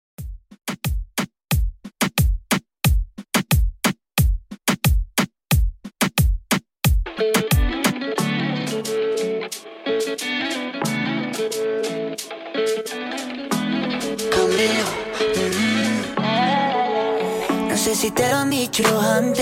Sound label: Reggaeton 90Bpm